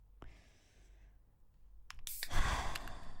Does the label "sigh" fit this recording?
Yes